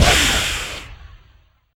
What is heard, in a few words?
Teleport